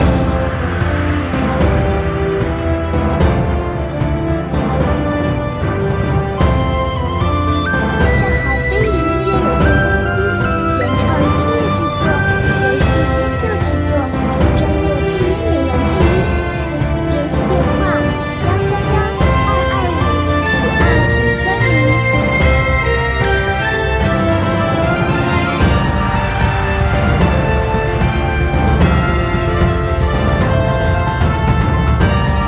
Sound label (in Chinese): 宏伟-管弦-希望-战斗准备-日系